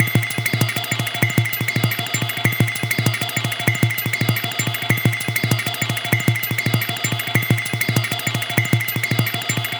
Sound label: groove up one octave; EUROPA; FRENETIC FRACTAL GROOVES